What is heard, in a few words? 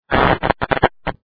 ELECTRICITY SINGLE